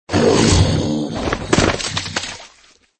caida

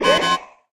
inicio formulacion, hechizos

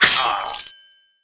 apunyalar

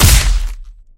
pelea

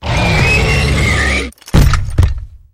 caida

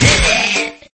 caida

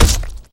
pelea

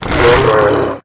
caida

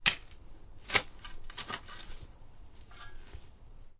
cavar

